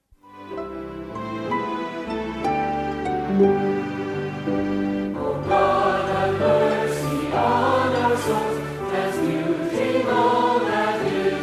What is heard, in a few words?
devotional song